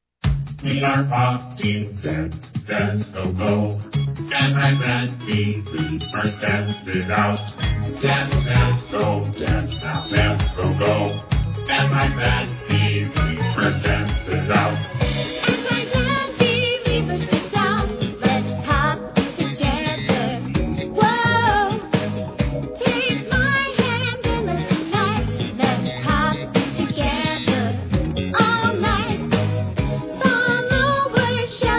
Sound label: Female Version; Pop Team Epic